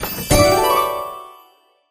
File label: mystery transform; Racetrack Riches; megaboard